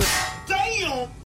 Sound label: metal damn sound effect